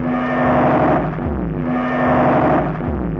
Crude Tape Loop, Tape delay; radiosonics

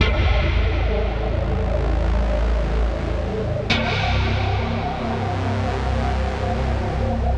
A Bass Heavy Hammer, 130; Zero-G; Future Wave

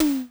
E. Tom, Yamaha EMR-1

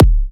Hard Kicks; Antidote Advent

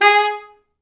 G#3, Pop Brass; Best Service ProSamples vol.19; AKAI

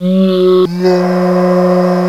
glass; Rubber Chicken Software Sample Bank; OLD SYNTHS; OTHER OLD

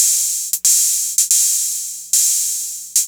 808 Hats

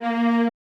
F#A2 L, Orchestra; AKAI Advance Orchestra Upgrade 97 Vol.1

